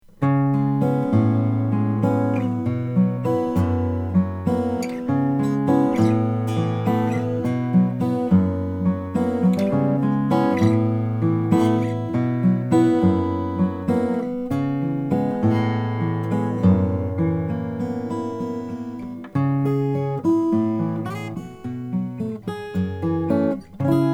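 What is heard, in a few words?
froggy bottom k deluxe 5a madagascar rosewood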